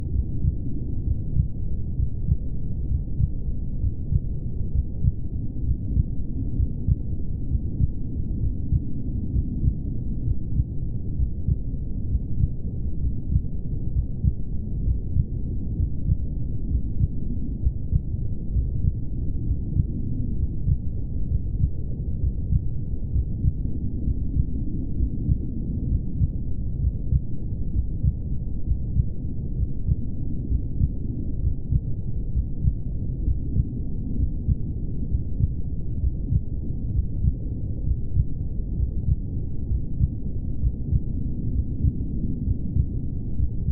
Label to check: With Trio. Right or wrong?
Wrong